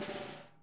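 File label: snare buzz; Sonic Reality; Interactive Drum Kits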